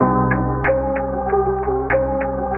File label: keys intrigue and suspense